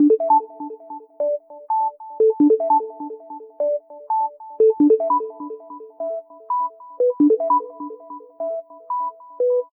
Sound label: samsung galaxy